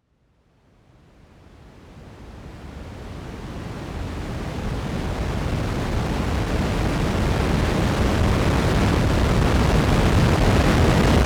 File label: Reverse Noise